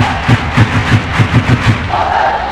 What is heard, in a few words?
Match sound; Doodle Jump; 3DS, Android, Arcade, DS, iOS, Mobile, Xbox 360